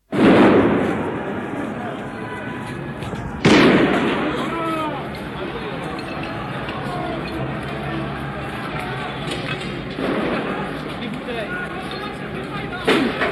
Paris Riots, general background, with many smoke grenades; Crowd; BBC